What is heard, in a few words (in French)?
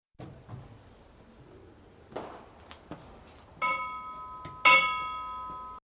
cloche; Claira, cloche 2